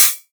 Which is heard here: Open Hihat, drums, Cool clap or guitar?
Open Hihat